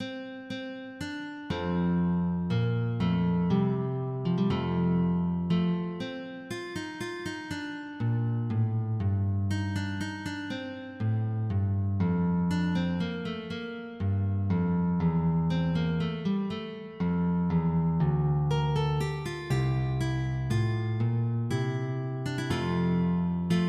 Capriccio; sopra Fra Jacopino

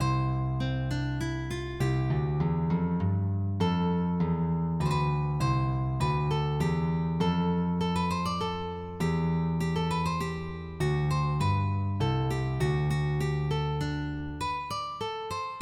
Menuet

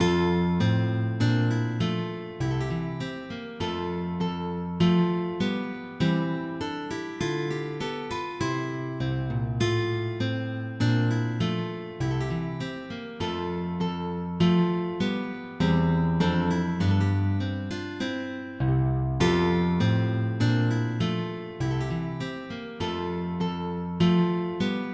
Gavotte

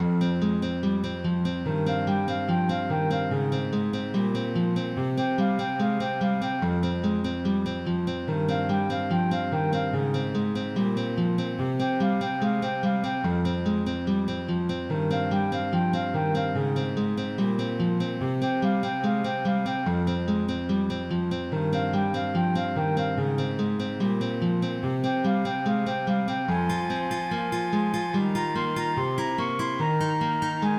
Prelude, MIDI; Lattente

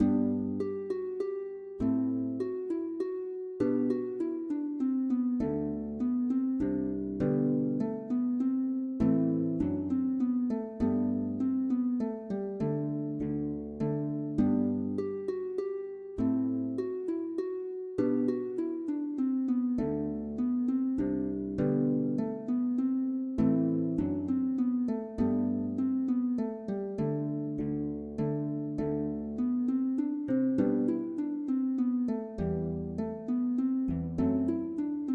Menuet

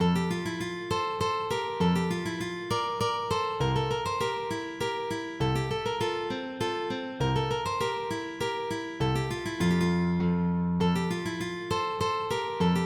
Polonaise, MIDI

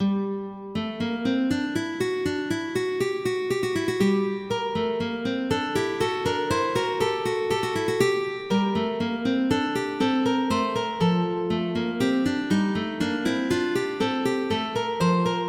Canon for two lutes, MIDI

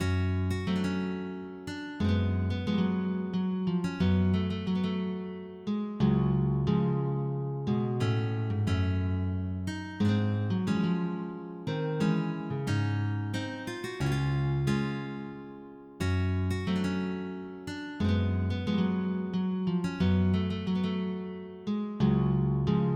Sarabande, MIDI

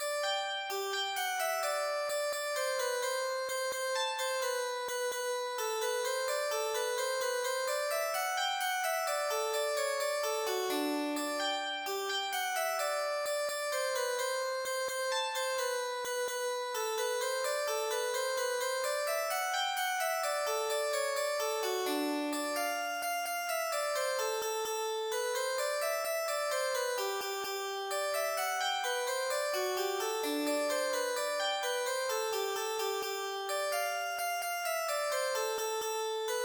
Quick Step, MIDI; 32d Regt

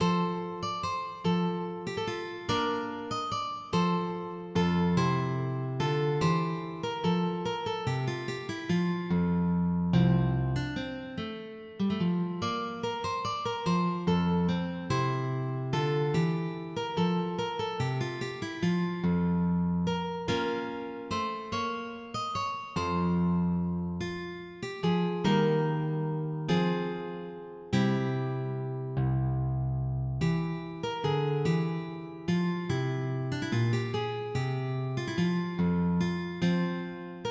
Courante, MIDI

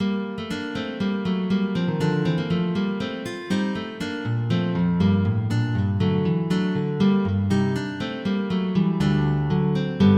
Preludio, MIDI; Quatro

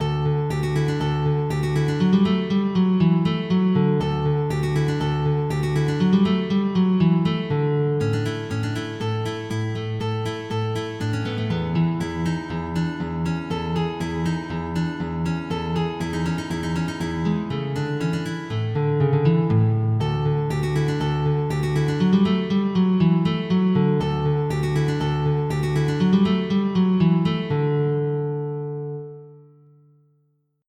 Musette in D Major

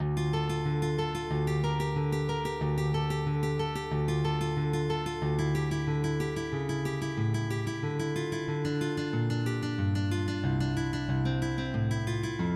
Suite en ré mineur Prélude, MIDI

